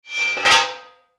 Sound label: Tank hatch opening